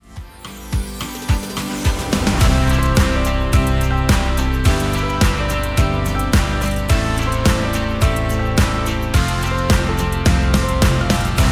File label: Kids; music for videogames